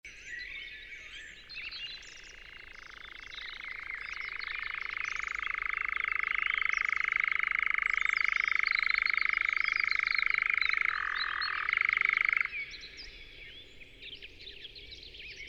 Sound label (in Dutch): Nachtzwaluw Caprimulgus europaeus; lente